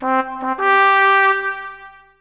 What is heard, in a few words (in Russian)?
truba